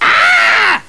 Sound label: aah